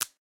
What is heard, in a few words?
snap